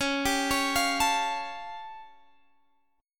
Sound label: A Augmented 7th Db